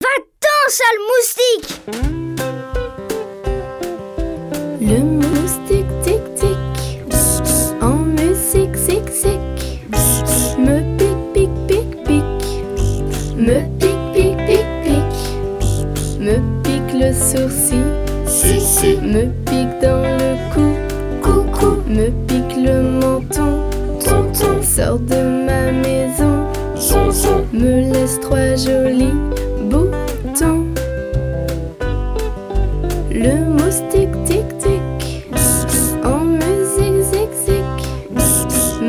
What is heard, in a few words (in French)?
Le moustique tique tique Bzzz bzzz En musique zique zique Bzzz bzzz Me pique pique pique pique…; Bzzzz Bzzz Bzzz